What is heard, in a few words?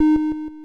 diamond collect